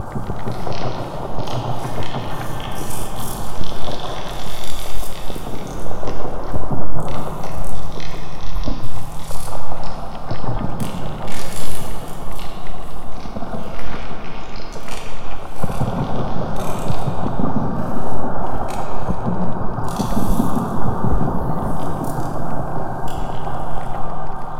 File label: ice loop; Quake 4 Alpha